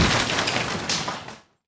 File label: wall crack